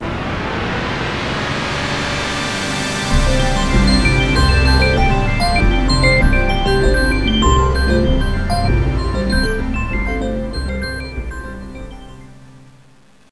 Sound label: logon; WinNT5 Beta1